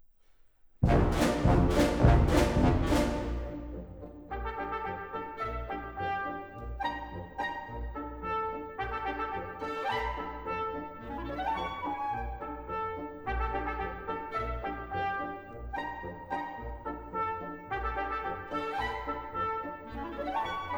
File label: Suite for chamber orchestra, Polka; Stravinsky